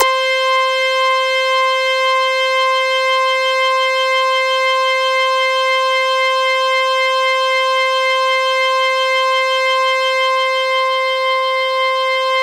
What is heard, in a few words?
C5, Synth Line; 90 s Sample CDs; NiceBeats; SynthLine VOL-14